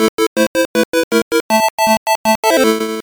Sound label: Unused Jingle